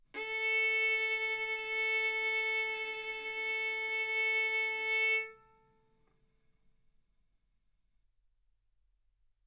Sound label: Violin arco A4 B4; mf; sul A; stereo